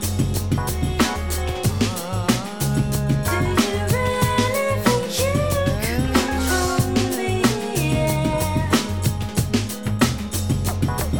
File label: Native Bass, Breakbeat, Drum N Bass